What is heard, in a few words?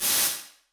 Drum Flutters, Drum Reverbs, IR Samples; Convolution; NI Kontakt 5